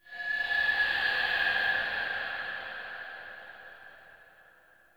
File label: Interior Texture High Screech Calls, Infested, Ambience; Misc Indoor